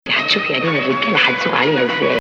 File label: Bayati Ajam